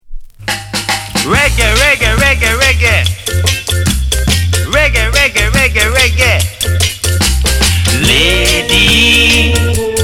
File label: REGGAE